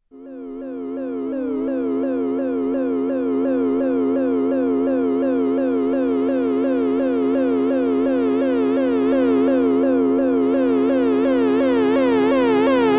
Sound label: Synthi Effect